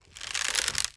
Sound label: Wood Bow Catapult Creak; Game Weapons; Military; Game Essentials